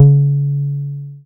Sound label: BAS SH-101; Best Service Gigapack 2